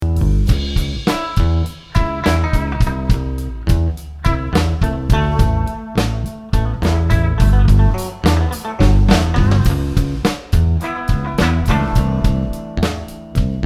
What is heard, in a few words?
Scratch